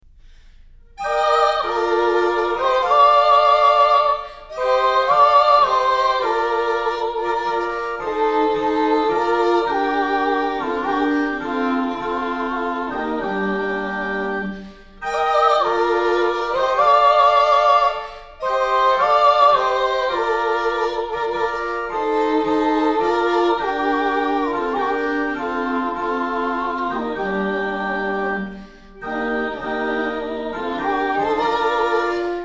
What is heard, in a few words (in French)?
Srabande faux bourdon voix aigües